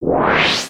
Text Whip By

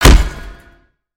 Spider Get Hit; creatures mechanical; Lego

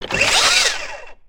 leg servo, creatures mechanical

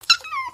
Kitten Meow; creatures organic

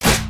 on hit, creatures organic

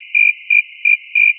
Jungle Crickets; Wings; ambience ninjago

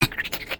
Trex Running Growls; creatures organic

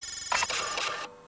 Broom Bot Sound, creatures mechanical

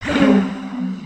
idle, creatures organic

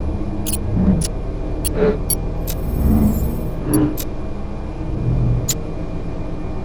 Machinery Antennae; ambience spaceship

